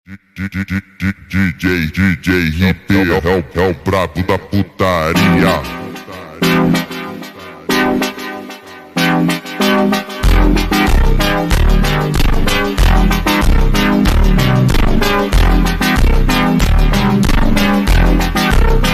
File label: instrumental super slowed reverb